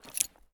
rgd safety; Escape from Tarkov

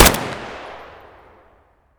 adar fire outdoor close; Escape from Tarkov

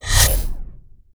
ui screen out; user interface

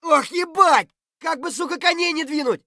hurt light; Escape from Tarkov; scav3

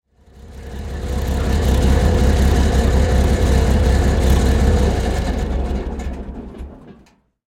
hetzer engine stop, tanks; War Thunder

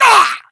death

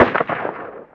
gun ak47 dist

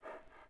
Animal Breath Large; Civ4 beyond sword

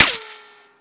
impact snow; weapons; Modern Warfare

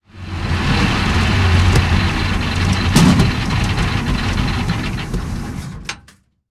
panzer6 engine stop, engines tanks; War Thunder

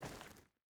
footstep hide stones walk; Rust

